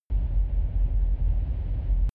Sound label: drone spaceship rumble soft